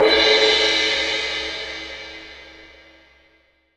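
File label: mallet cymbal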